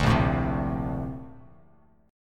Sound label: A sharp Major 9th, Bass Standard; strummed